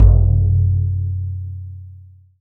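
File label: FRAME DRUM, ETHNIC PERCUSSION; AKAI S6000 CD-ROM - Volume 5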